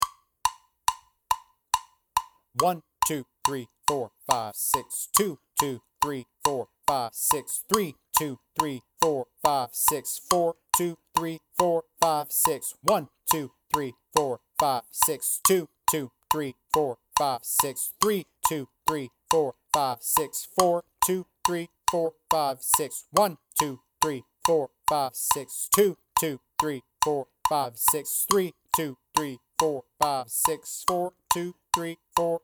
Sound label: 70 bpm, click tracks